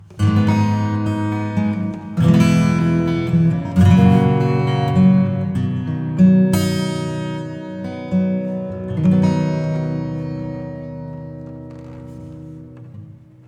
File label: Reverb Acoustic